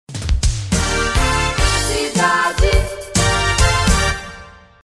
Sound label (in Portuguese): Vinheta; Cidade FM